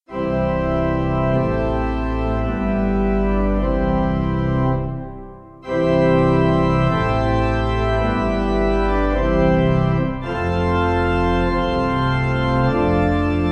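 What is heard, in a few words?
pipe organ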